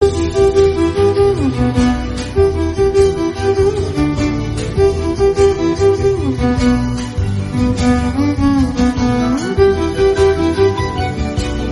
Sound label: violin cover bgm tamil